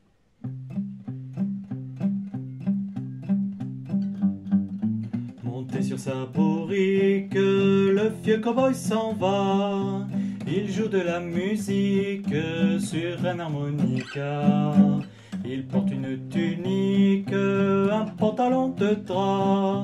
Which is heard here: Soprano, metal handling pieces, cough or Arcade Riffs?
Soprano